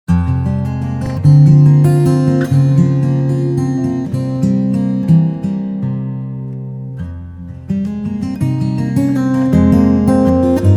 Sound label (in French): Fugue